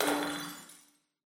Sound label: glass break; ambient; amb oneshot